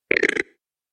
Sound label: Strider; استرایدر در ماینکرافت